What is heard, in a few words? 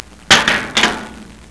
Trash Can Bang; Aldus SuperPaint 3.5